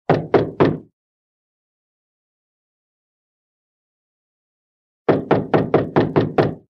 door knock; wood

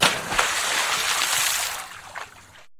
Small Splash; Water Splashes

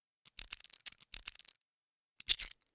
washing wrench; ship

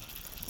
fishing reeling

